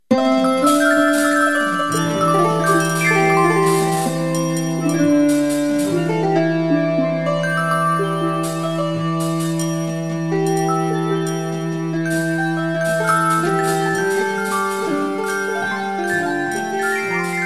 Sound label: golden ratio tune vary by seed pos